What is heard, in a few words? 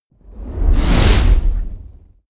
whoosh fire noise blast large